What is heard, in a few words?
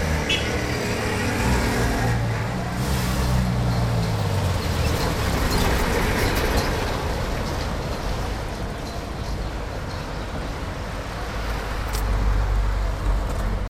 cars old cuba, street traffic; best service Blue Box